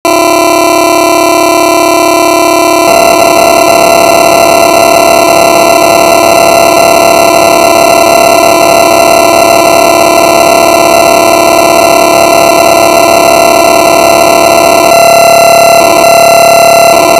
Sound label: Rain Drops; Galaksija; Emisiones Radio-Games